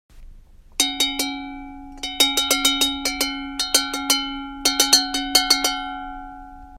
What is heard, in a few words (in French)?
Cloche